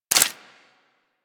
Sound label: Bullet Impact Debris Wood